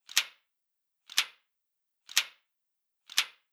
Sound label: clock tick tock loop